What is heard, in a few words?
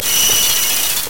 Warning; Metroid